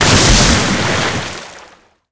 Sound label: zodiac splash big; veh zodiac splash big; Modern Warfare